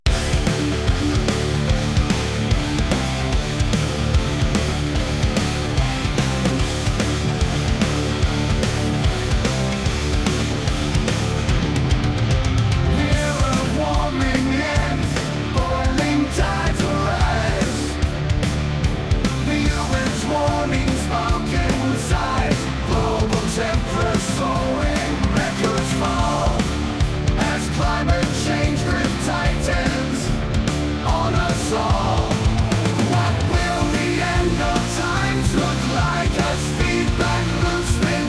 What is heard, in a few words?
Urgency Screams!